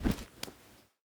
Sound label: waterpipe shotgun deploy; Rust